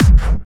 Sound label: Kick, Drums; Bonus Drums